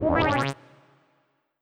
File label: error